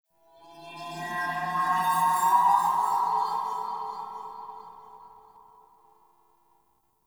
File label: Sentient Lair Texture Interior Medium; Warframe